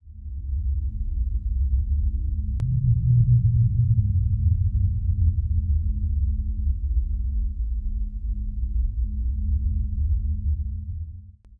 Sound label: Temple; Seer; GamigoNA